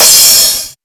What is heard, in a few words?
Cymbal